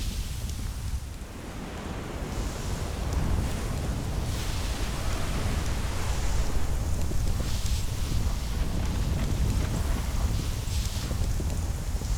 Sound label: amb blizzard